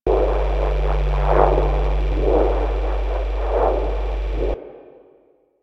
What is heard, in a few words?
Bridge Pulse; Warframe; Liset; Hub; Machines